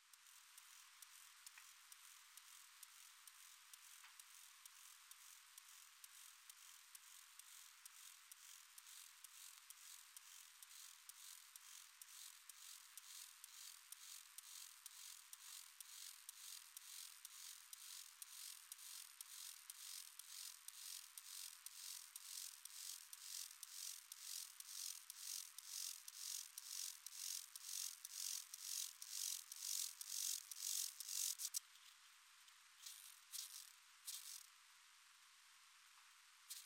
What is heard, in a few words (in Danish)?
sydlig markgræshoppe